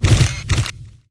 flash photo; Miami Beach; Wazdan

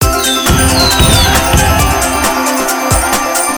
countup; games; Billys Game; slot

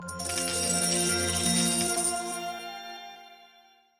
crystal x2; Lucky Fortune; Wazdan

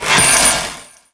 chest; Golden Sphinx; Wazdan

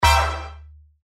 hit; Crazy Circus; circus plus fg

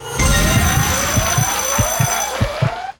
mega win; Fire Queen

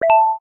hit, keno; Bingo

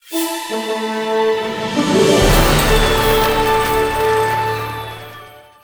WIN MAX; Ultra Hot DX

